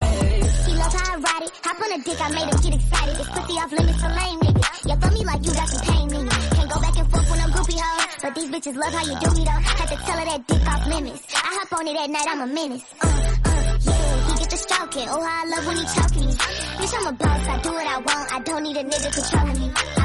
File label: english song